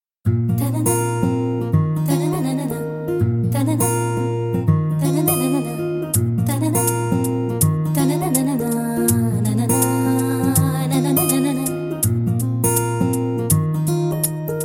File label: Female BG Humming Ringtone; Mr Majnu